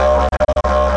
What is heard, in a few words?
pusher; SirBomb